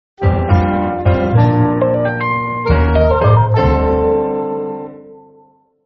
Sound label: Nokia Jazz, ringtone